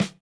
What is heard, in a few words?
snare, snare house